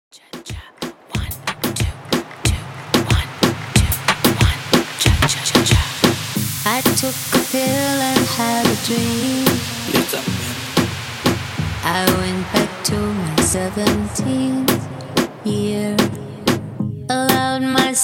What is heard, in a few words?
Reggaeton 92Bpm